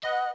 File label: Pound, Keytone sounds; LG GD550 Pure